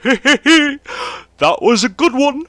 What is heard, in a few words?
haha; NWNDS; nigel